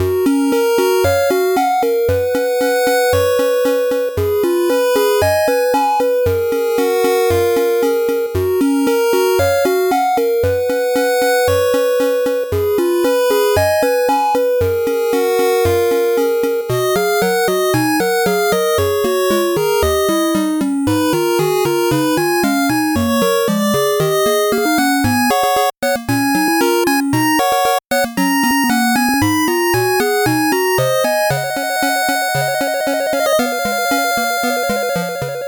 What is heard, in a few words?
8bit bgm fast